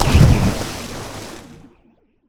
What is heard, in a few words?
life impact; Effects; MagicArsenal